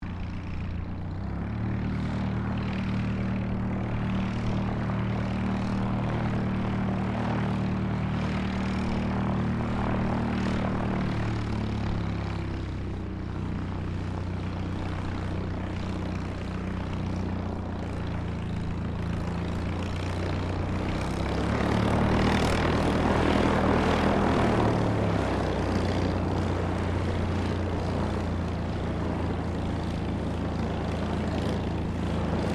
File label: AIRCRAFT DEHAVILLAND TIGERMOTH TAXI GRASS, RAAF Tigermoth biplane training aircraft